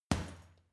boxing punching bag uppercut medium

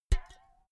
filled can hit bounce ringing

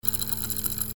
energy and rays

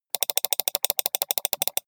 computer mouse click sequence steady

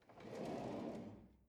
drawer cutlery draw in

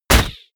crossbow shot

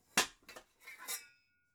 pots pick up

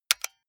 console controller xbox rt press fast

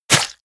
hit blood light

